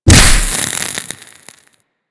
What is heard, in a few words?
Generic Ability Impact; Warframe; Ember